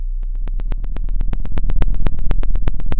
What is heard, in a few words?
pop, Sound of a fire crackling